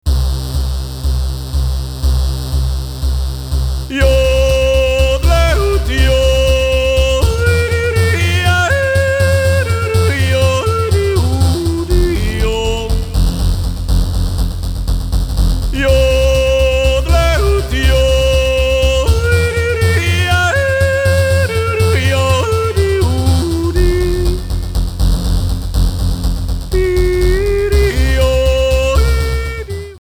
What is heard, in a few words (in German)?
Jodler